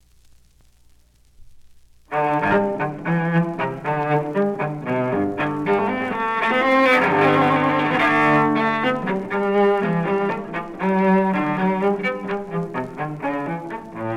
Suite N° 1 In G Major, BWV.1007 For 'Cello Unaccompanied; 6th Movement; IMSLP